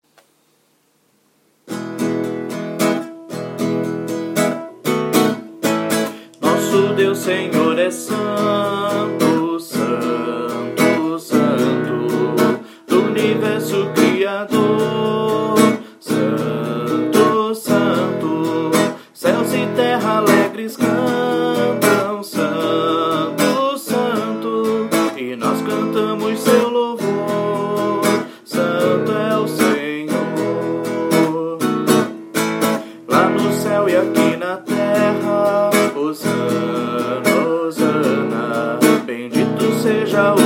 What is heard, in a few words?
vocal